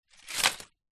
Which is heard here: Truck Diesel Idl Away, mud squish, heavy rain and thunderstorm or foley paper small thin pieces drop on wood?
foley paper small thin pieces drop on wood